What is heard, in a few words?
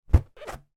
foley balloon inflated grab with hands